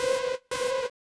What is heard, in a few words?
175 D&B Synth Cm; Dance DJ